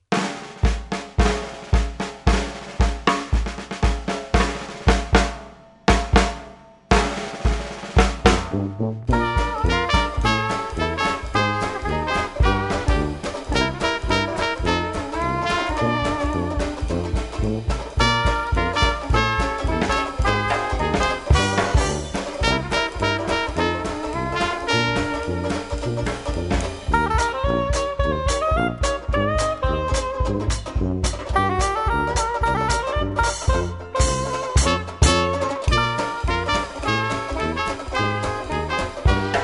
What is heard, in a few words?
jingle